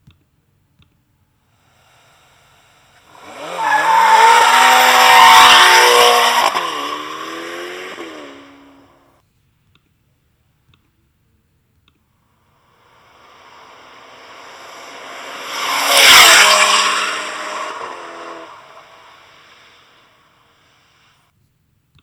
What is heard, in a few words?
Ferrari Portofino Engine Revs